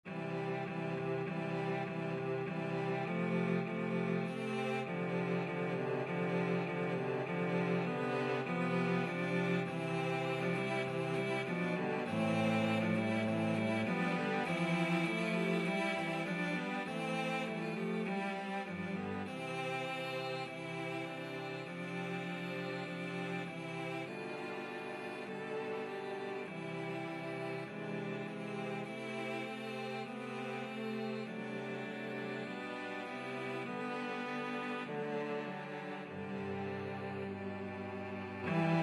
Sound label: cello quartet